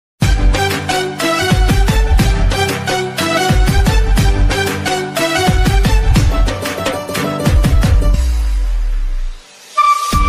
Happy Diwali Bgm Ringtone

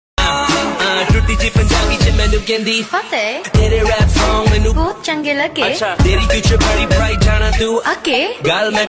Punjabi

